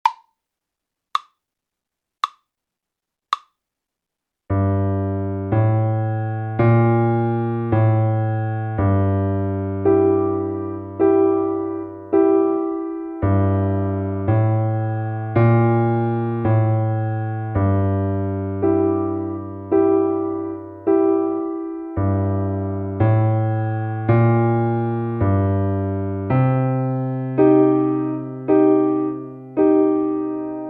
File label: Blues slow